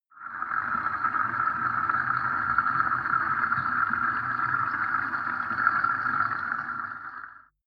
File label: bathtub underwater fill soft